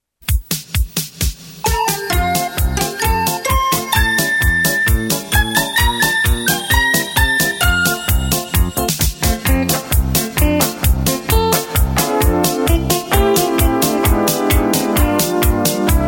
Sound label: music